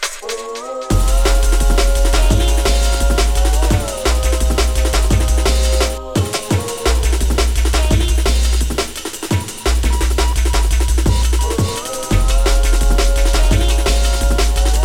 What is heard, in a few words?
Jungle Drum Bass DJ Mix